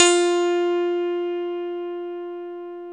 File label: KEY MKS, Pop Pianos; Roland L-CD701